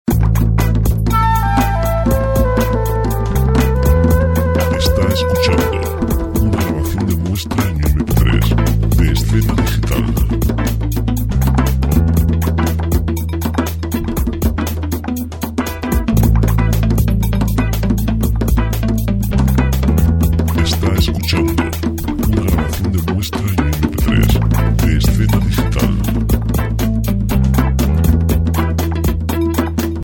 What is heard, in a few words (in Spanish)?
etnica flauta; spots; musica libre jazz